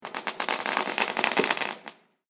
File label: Fireworks Firework Sparkles